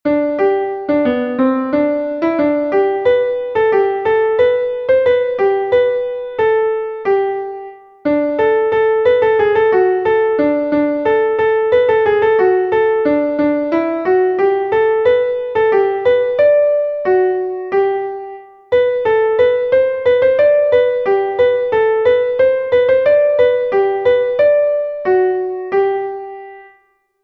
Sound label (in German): klavier; das wandern ist des muellers lust; melodiemeister